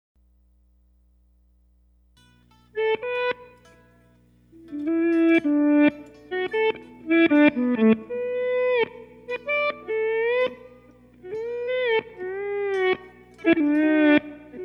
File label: backwards